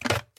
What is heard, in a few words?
Teapot Take; Game rip; MiSide